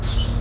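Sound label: Compressor End, Train; OpenBVE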